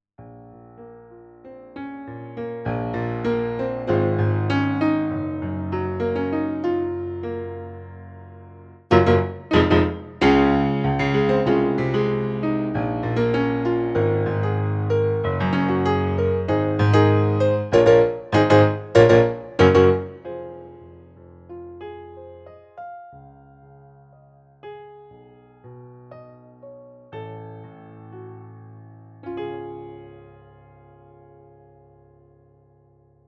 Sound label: Piano